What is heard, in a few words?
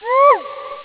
wolf call